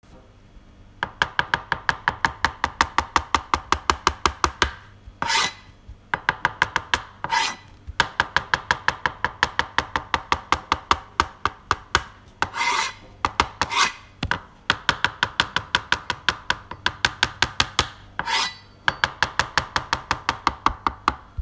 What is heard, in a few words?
Choping